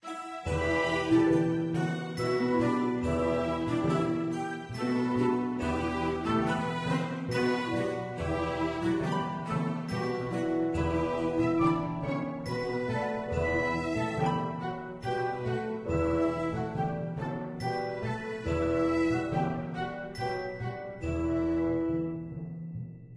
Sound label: Island Loop; gamerip; tempest pirate action rpg